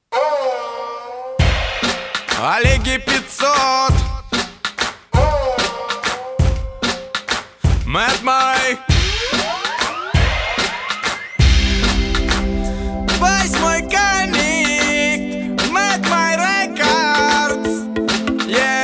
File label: instrumental